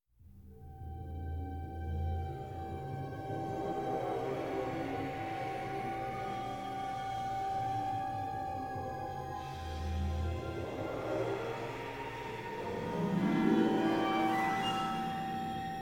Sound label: Trumpet Mute